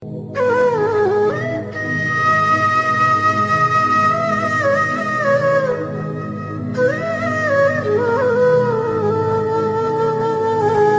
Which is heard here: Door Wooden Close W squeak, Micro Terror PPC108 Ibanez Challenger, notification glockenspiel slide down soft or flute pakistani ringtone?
flute pakistani ringtone